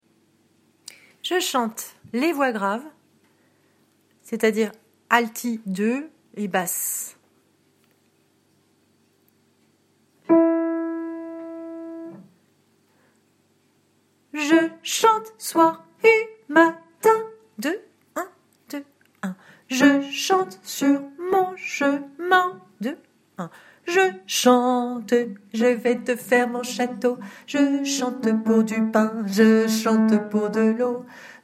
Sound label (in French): hommes; bastienne